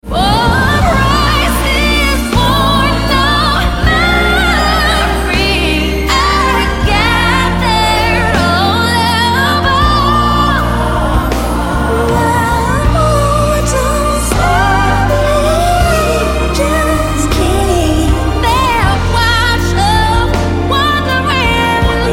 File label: little drummer